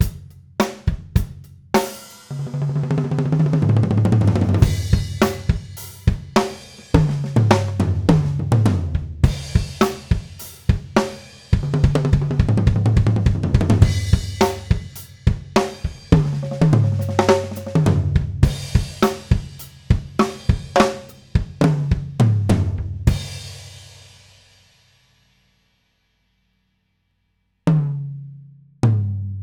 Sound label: Toms, drum; drum shootout